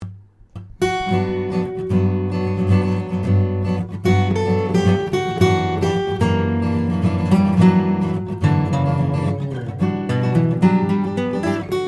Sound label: solo